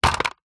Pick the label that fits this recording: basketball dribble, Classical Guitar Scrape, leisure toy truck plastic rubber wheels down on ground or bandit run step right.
leisure toy truck plastic rubber wheels down on ground